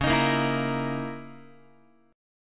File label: Db Minor 6th Add 9th Db down Guitar; Dbm6add9 strummed; x,9,6,8,8,9; JGuitar